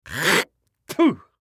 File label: Human Spit; Spit Male Old; Spitting; Blastwave